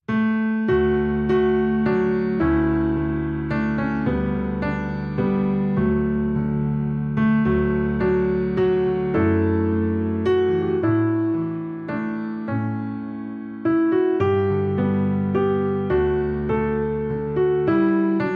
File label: Solo Piano